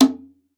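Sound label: CONGA SLAP; Xsample VOL-9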